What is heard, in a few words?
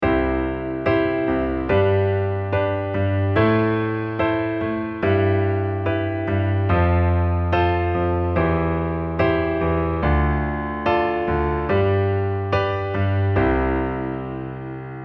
LH octave, accompaniment pattern